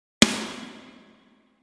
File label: Snare